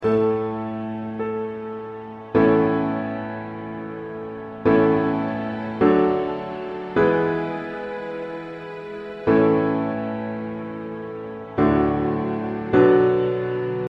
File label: Accompaniment